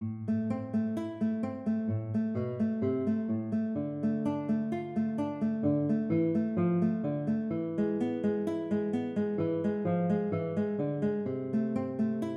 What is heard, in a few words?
Allegro, konzertgitarre; Giuliani